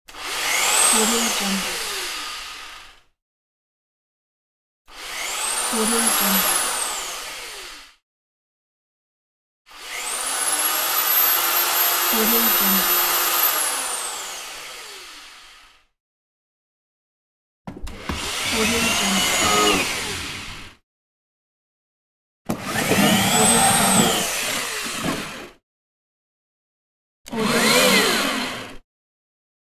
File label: electric drill pack; reazonate